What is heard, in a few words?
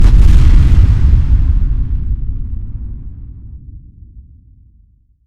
explosion very far